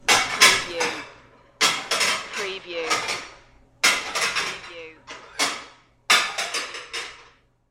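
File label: Plate Stacking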